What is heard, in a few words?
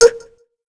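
Landing, Korean; voices; heroes; Shea; kings raid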